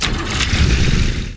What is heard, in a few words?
START; DriverEd; Lessons